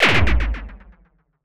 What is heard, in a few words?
Superkick Fx